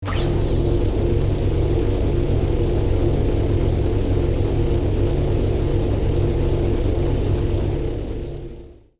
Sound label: Force field manipulation energy field